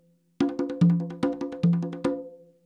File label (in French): frise, congas